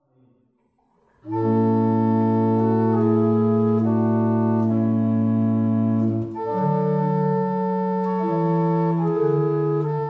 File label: Double chant, barrel organs; Staunton